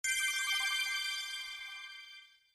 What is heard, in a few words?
item sound; ucn